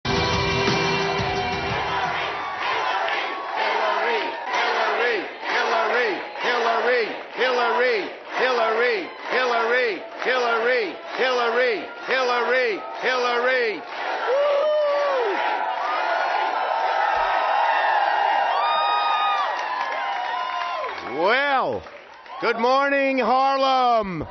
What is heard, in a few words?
chant in awkward monotone; chuck schumer leads hillary chant; No Agenda